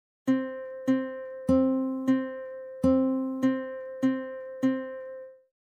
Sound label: Fermata Short